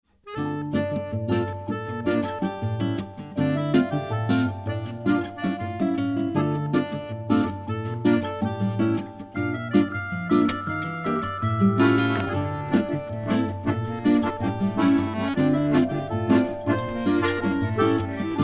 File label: Accordion Bossa